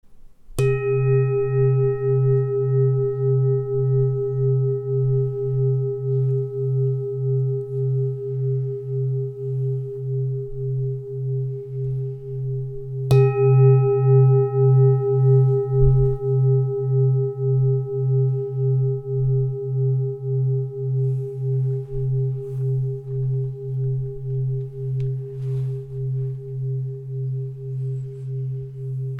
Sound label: chakra bowl